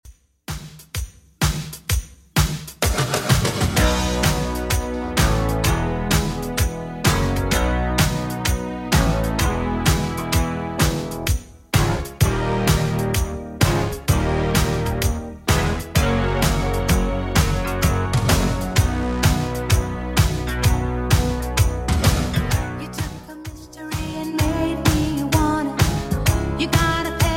80s Redrum 128bpm

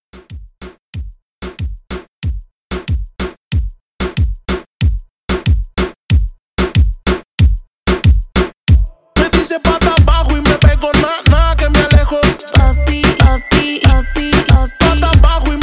Latin Reggaeton

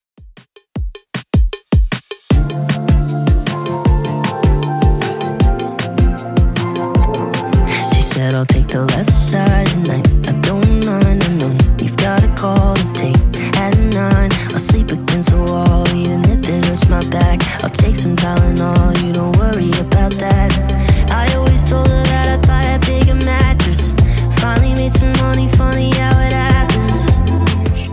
Top40 Redrum 156bpm CLEAN

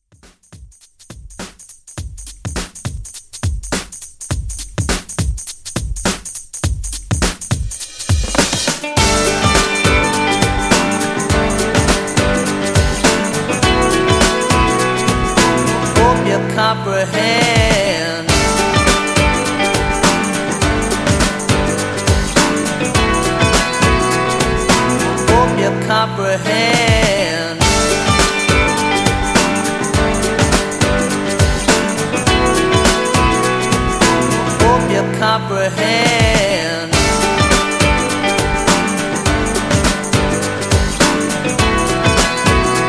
90s ReDrum, 103 Bpm

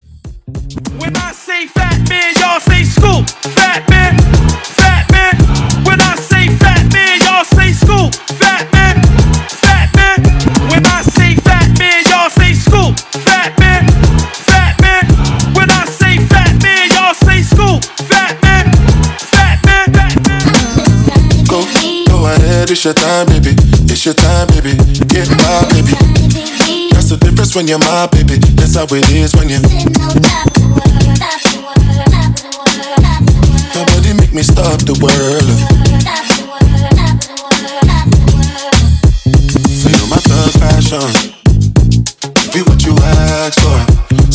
Top40 Hype In Outro 99 BPM; Top40 Hype In Outro Dirty

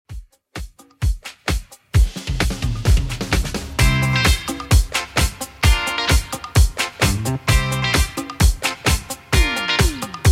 70s Redrum 130bpm

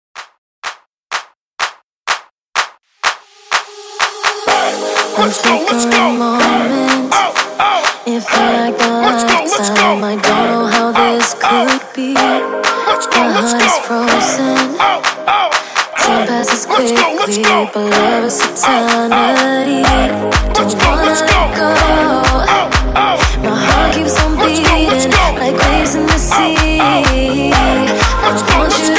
Clap Starter Hype Future House 125 BPM

